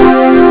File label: lead; Amiga Soundtracker Sample Packs